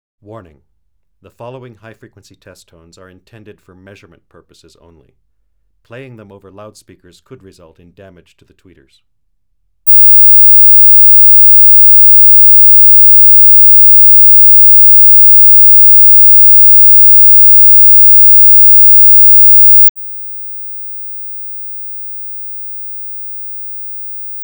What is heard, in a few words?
20KHz at 0dBFS & -20dBFS (L+R); Stereophile; Stereophile Test CD 2